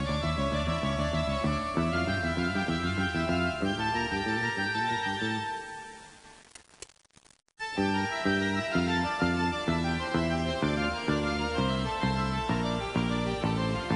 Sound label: Toccata